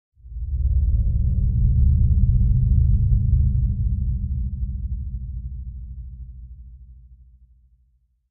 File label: Bed Galactic Flutter